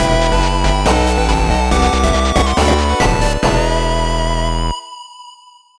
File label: success; no straight roads